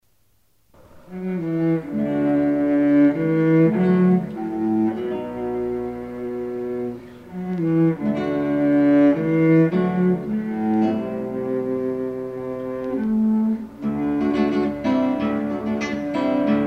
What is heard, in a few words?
Folksong Sonatina